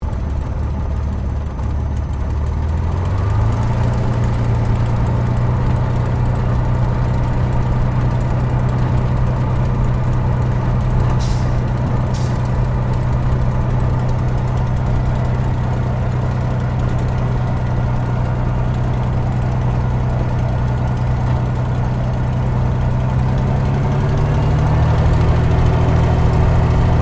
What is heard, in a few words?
AIRCRAFT SUPERMARINE SPITFIRE MK-VIII TAXI INTERIOR STEREO; Airplanes; Sound Effects:Vehicles